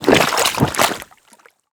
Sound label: mountain dweller creature orc footstep mud texture; Orc Sound Effects; Bluezone